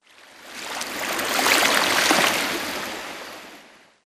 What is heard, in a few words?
swampland water lapping; Titanfall 2